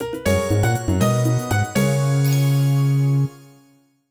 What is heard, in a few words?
Jingle, Perfect; streetpass mii plaza; flower town